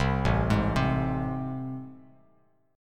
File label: A Minor Major 7th A down, Bass; arpeggiated; AmM7; Bass Standard; JGuitar